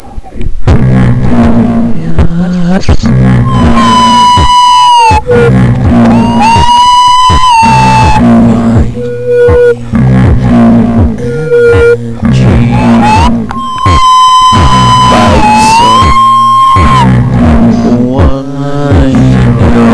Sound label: scary